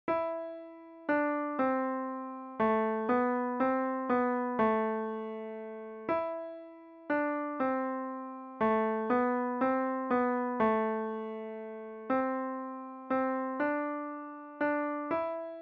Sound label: piano solo